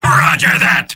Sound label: soldier positive vocalization; tf2; mvm